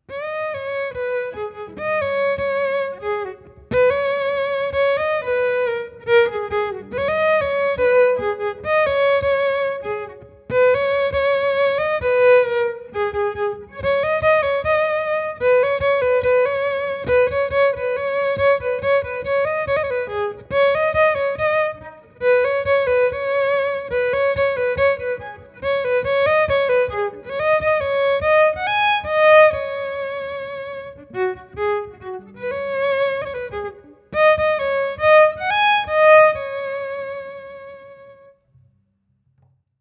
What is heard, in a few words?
solo violin